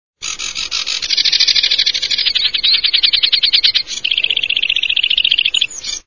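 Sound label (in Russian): kamyshovka barsuchok, Sedge Warbler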